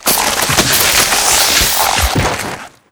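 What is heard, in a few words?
darksha death, monster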